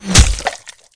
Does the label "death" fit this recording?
No